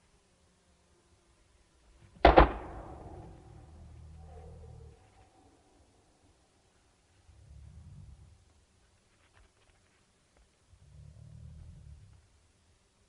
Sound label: Bighorn Sheep Ramming Heads; ZION